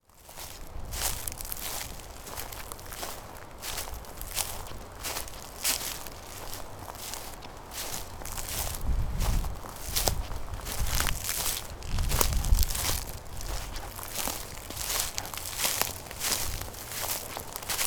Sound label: my footsteps pine Duff, Yosemite; Spring trip to Yosemite Valley